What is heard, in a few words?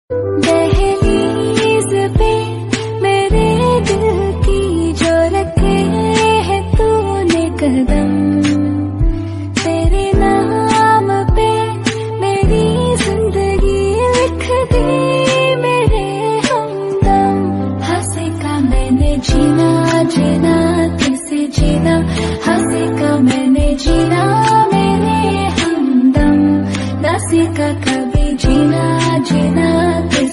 Female Version Ringtone